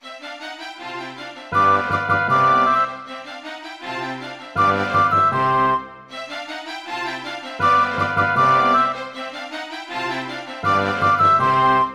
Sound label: Yamaha SHS-500; classical; Mozart; Concerto K467 partial